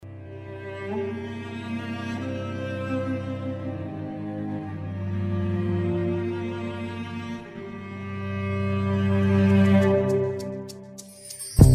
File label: Ballroom Rumba, 24-27 bpm